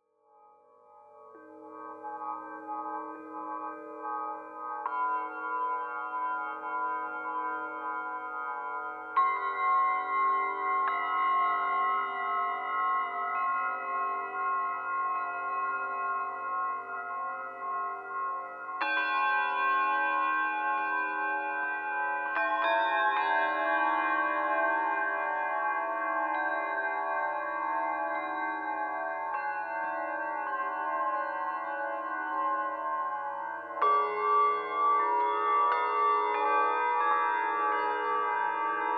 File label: Bells in Space